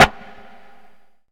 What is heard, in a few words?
rich lows steel snare drum sample f key, Royality free snare drum sample tuned to the F note.